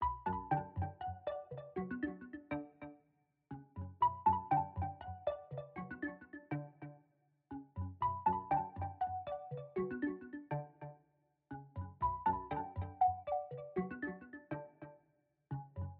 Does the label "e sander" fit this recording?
No